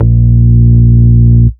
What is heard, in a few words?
Root A#, Ultimate Bass; Vengeance Samples